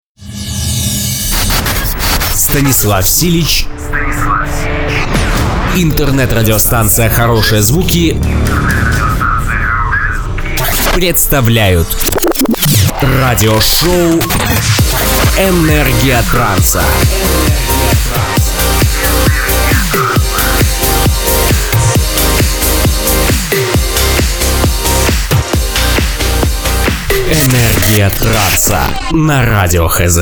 Trance Intro

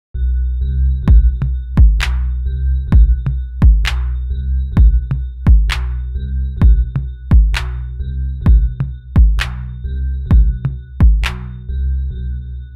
Rap beat

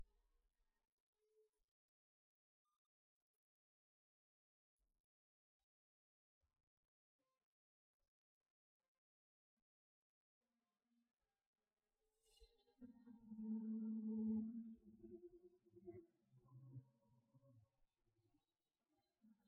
Acapella

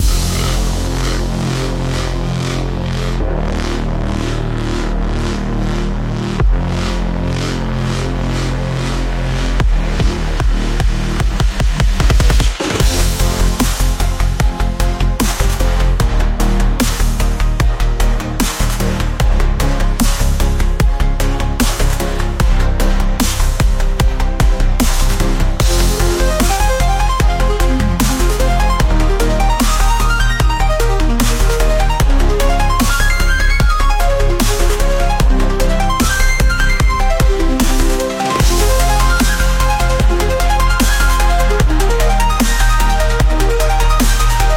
instrumental

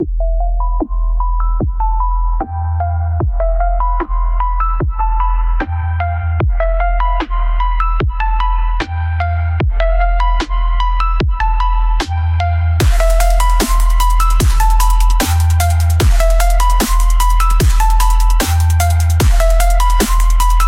instrumental

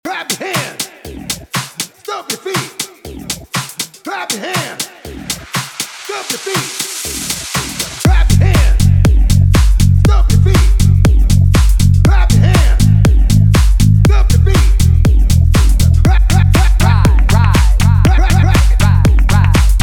Bass Beat Show Mash Up

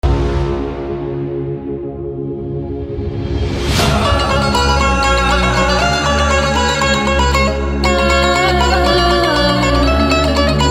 Hardstyle Remix

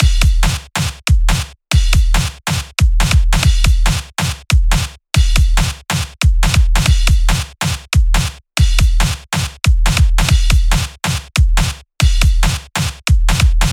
Bit

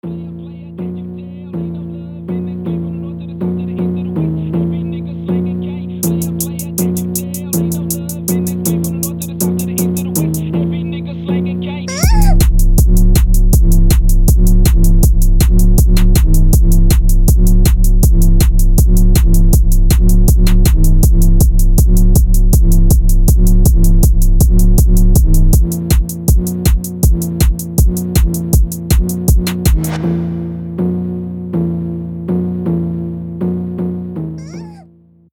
Phonk

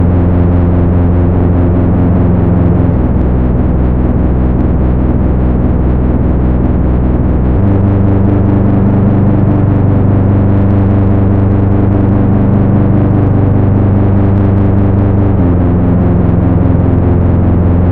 dark trance stream

